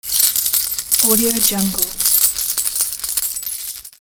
winning coins

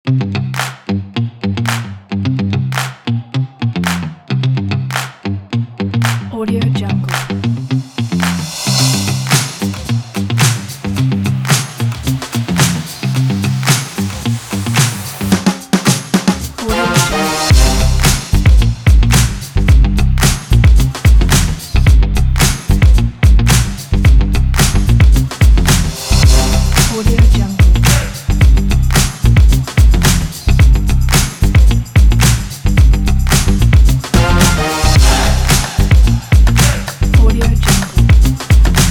funky opener

